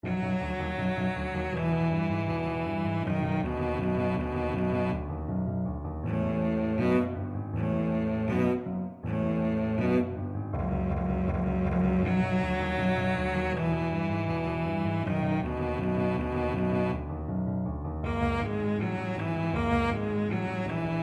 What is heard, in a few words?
put your skates on, cello